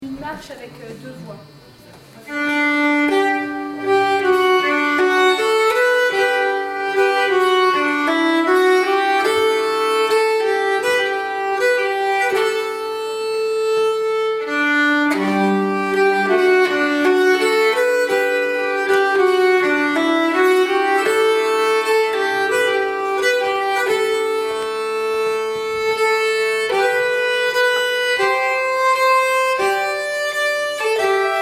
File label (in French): marche, nyckelharpa